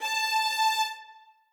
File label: string